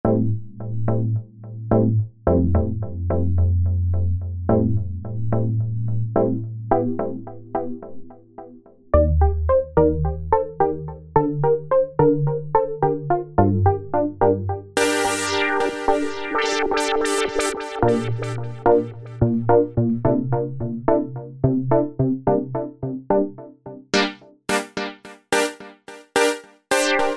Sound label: synth textures, Blips; Boscobel Blips; Apple GarageBand 2